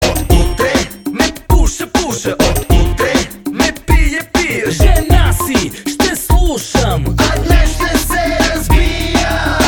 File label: win, music